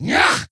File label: attack, creature; Shadron